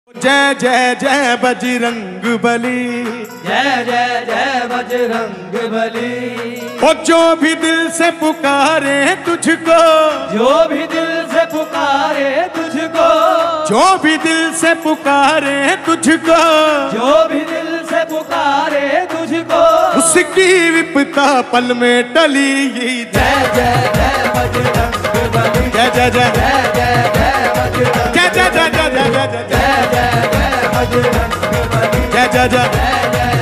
bajrang bali ringtone

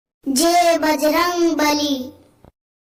jai bajrang bali tone; Hanuman Ji Tones for Mobile

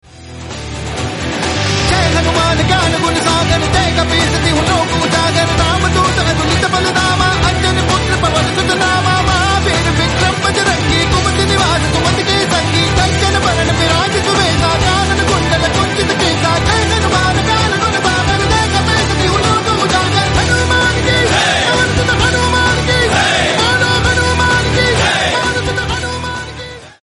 hanuman chalisa ringtone new version

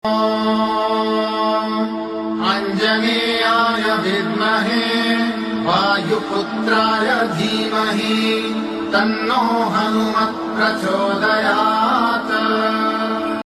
hanuman mantra tone; Hanuman Ji Tones for Mobile

